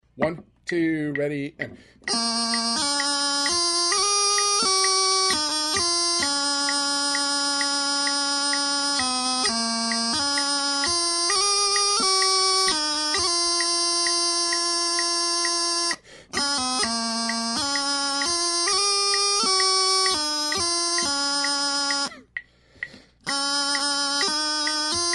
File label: Steam Train, bagpiper